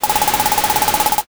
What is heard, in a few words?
printer